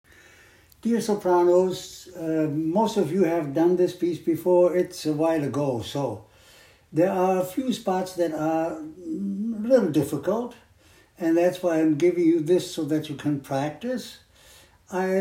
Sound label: Sopranos